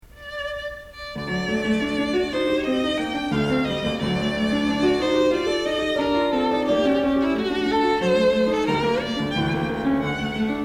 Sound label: Brahms Violin Sonata G Major Op78 Allegro Molto Moderato